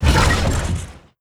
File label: queuing army; Stellaris